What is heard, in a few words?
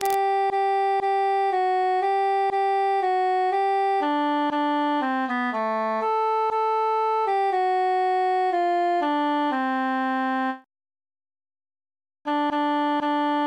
altus solo